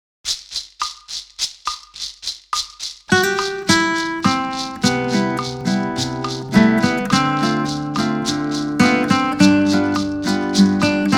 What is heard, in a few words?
Bossa